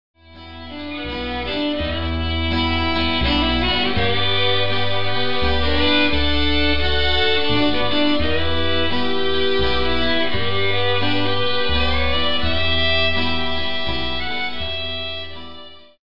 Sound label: canadian waltz